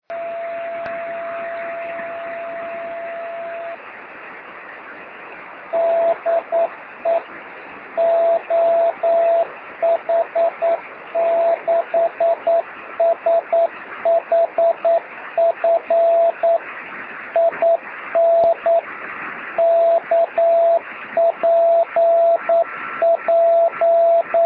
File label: 23cm beacon; oh6shf